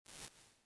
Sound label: Reverse and Tail, Reverb; Experimental